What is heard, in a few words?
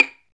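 STR Violin Pizzicato, Solo Violin FX; Roland L-CD702; Solo Vln FX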